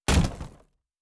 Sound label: Shield Wood